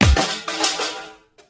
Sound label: Toaster